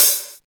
Open High-Hat Sound G Key, Royality free open hi hat tuned to the G note.